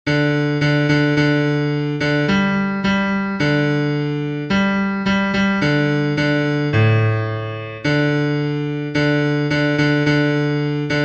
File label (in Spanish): bajo, himnos en piano; cantos del camino